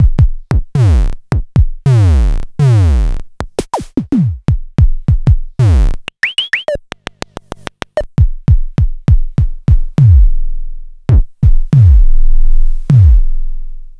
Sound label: Klone Dual Percussion Synthesiser drum hits mono